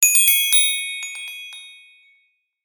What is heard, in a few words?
Chimes, notifications; HTC Rhyme